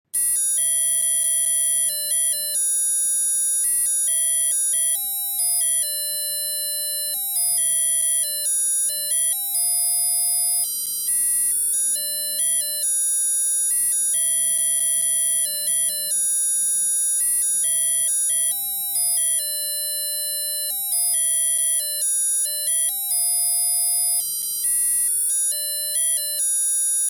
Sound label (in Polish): pokaz dzwieku alarmu